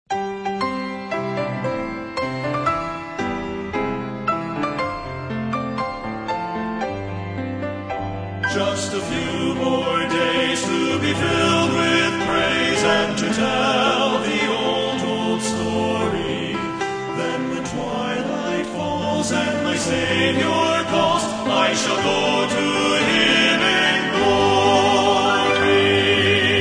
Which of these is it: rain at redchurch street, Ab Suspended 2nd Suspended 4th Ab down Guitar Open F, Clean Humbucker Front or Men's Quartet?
Men's Quartet